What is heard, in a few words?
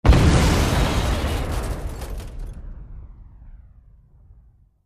Explosion Metal Deb, metal & glass